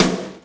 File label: snare rim, virtual drum sounds